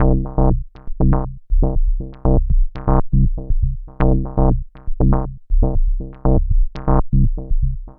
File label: Bassline, Prototechno